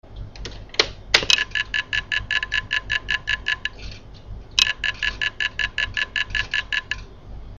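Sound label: IBM DJSA 220, hard drive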